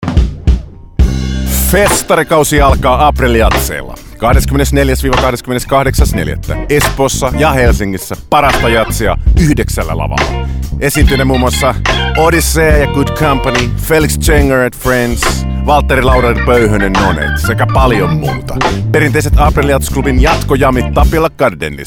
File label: Jazz; April Jazz 2019